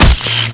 hit bod; weapons

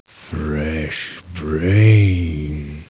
zombie alert; svencoop; hunger; hungerzombiecop

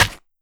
ric gravel

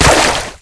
slosh; svencoop

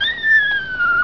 die; hunger; hungerhound

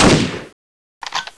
sniper fire last round

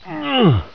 pain; svencoop; hunger; hungerbarney

